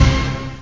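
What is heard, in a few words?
Orchestra Hit; GMPlay; Ensemble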